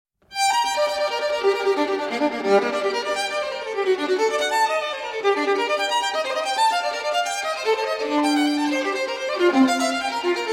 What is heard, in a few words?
violin solo; J.S. Bach Sonata for Violin Solo No. 1 in G Minor, BWV 1001; 4. Presto